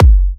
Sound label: F# kicks; markus hakala kicks